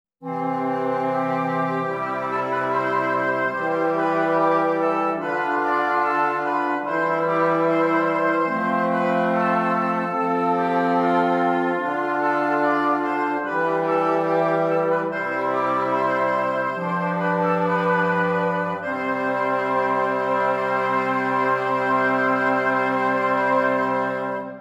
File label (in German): Stimmiger Contrapunkt; 5 Gattung; Ronge